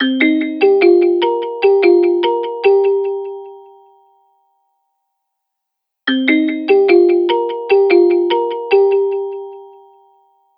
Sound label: RINGTONE; telefonica; TU GO